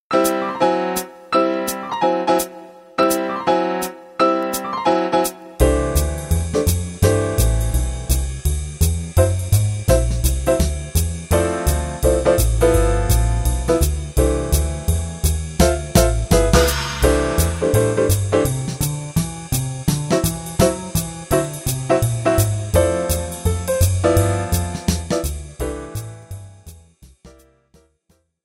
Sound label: jazz time